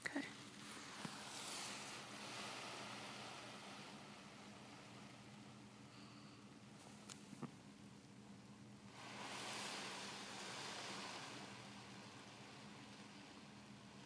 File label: Plastic Bag Waves